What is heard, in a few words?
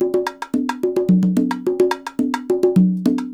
144, Percussion works, LOOPS; Sampleheads; NYC Percussionworks; 90 s Sample CDs